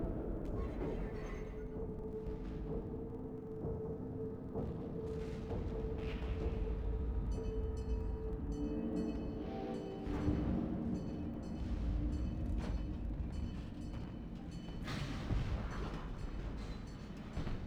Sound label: no battle; WAR